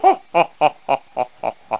Laugh; Niko laughing

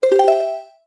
Picto Box Save; Save a snapshot (Recorded)

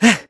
Ready To Jump; ready to jump to his Loftwing; Link; Skyward Sword; zelda

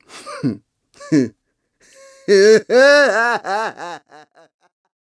Laugh Escape; Ghirahim; Gate of Time; Skyward Sword

